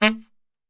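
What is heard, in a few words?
A saxophone shot, Brass Super Section; Best Service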